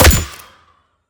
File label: Sciweap Shot Small Gun P70 Sidearm